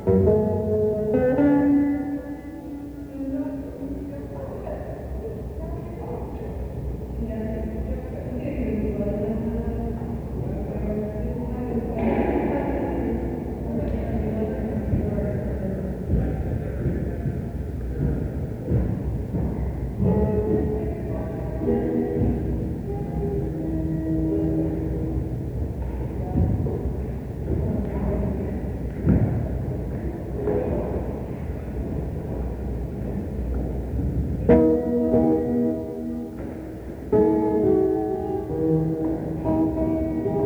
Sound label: soundcheck